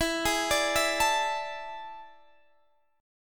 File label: E 7th Add 11th